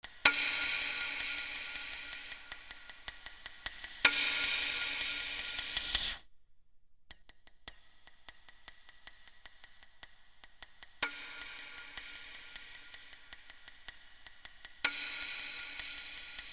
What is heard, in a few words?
RHYTHMIC